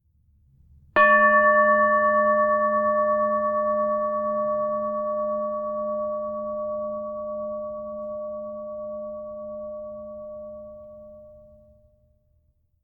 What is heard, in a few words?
Qin-Chime Bowl